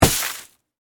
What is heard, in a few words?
Snowball Impact, Weather, Snowball, Impact, Big; Snow Sound Effects; BlastWave